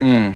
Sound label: mmm